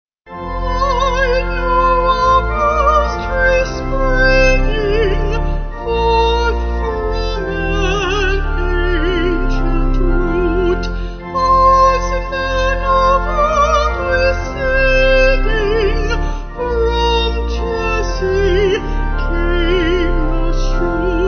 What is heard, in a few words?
I Know A Rose Tree Mystica Vocals Organ